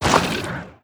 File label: molluscoid pops move; Stellaris